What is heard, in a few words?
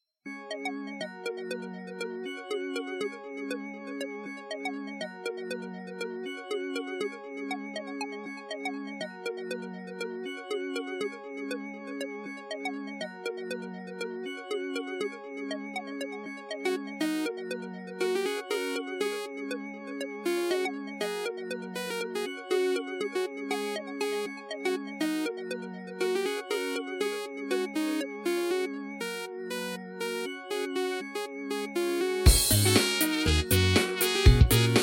korg m01d; weeklybeats-2014